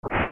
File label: chest close; ff3; ff3us